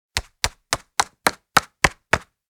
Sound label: Monopoly board game token moving